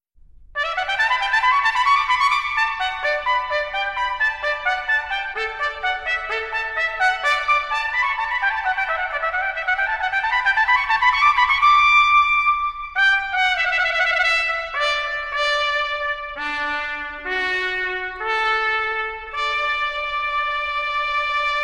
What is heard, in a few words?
Wedding Fanfare, Trumpet; the trumpet wedding book